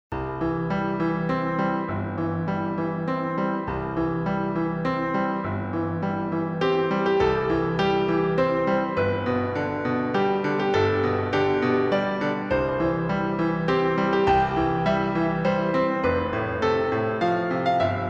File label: Happy Birthday to You Piano Version